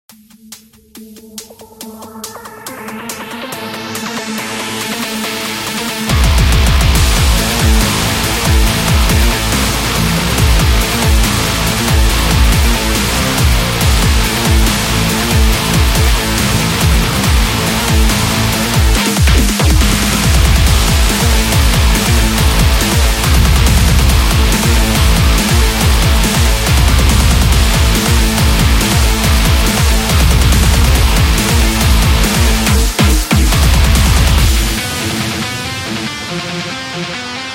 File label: Brutal Sport Dubstep